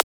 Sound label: Transient; FLPRO